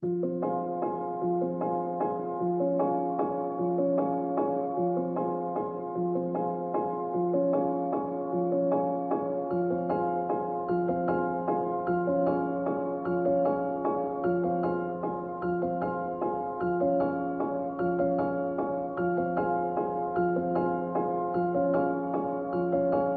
Piano Only

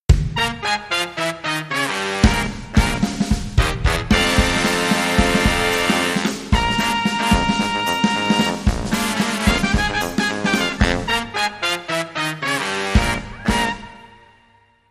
Brass

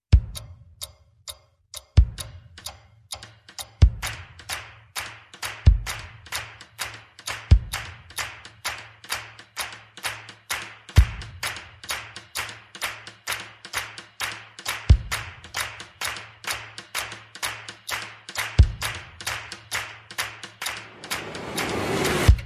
Percussion and FX

